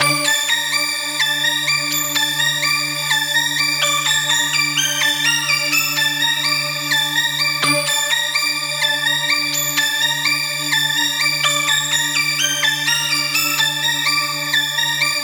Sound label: Chord 126 D Min; future pop; Nexus